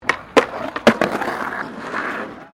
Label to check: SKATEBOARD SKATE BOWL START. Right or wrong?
Right